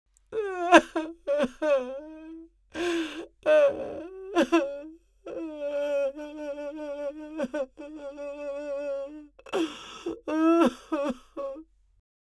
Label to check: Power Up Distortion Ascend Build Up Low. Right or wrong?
Wrong